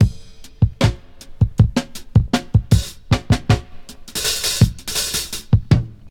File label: 79 bpm drum loop sample g key